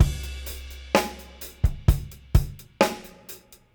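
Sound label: 64 bpm, beat loops; Zero-G; Monster Beats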